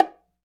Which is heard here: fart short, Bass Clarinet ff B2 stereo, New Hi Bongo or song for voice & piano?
New Hi Bongo